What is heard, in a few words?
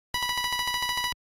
score up